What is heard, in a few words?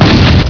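HAIL STORM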